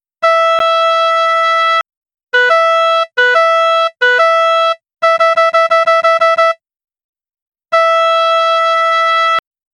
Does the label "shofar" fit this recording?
Yes